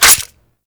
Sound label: Dual Pistol Mech; Queen Guard